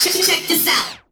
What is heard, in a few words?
Vocal Phrases; Vengeance Samples; Vocals